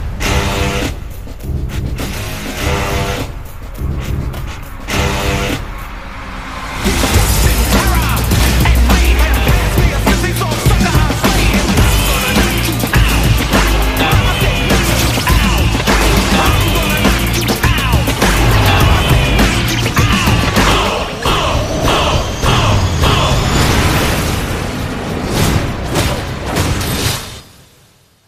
trailer music soundtrack, ringtone; deadpool 2 final

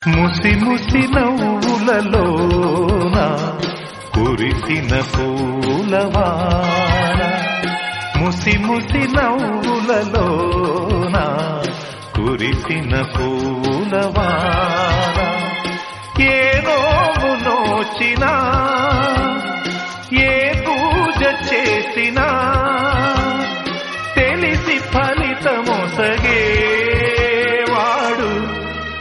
telugu classic

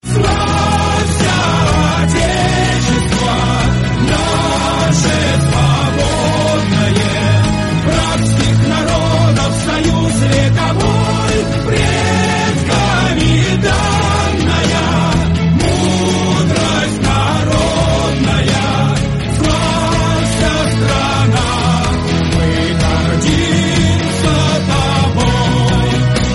russian hymn; ringtone

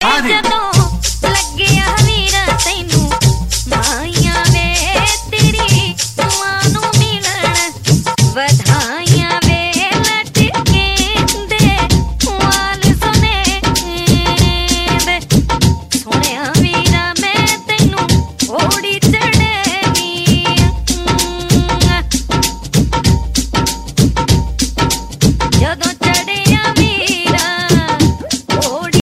boliyian old skool punjabi; ringtone

